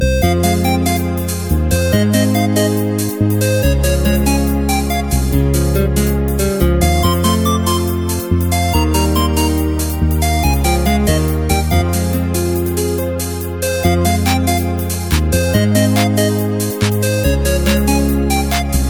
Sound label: instrumental remix